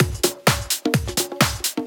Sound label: Latin Loop 128 BPM